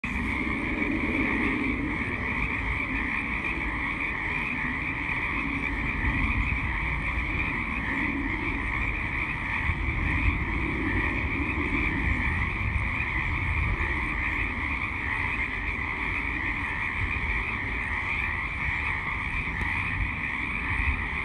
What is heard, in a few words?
Frog Chorus